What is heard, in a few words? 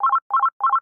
call, messenger